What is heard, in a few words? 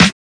verby snare drum sound f sharp key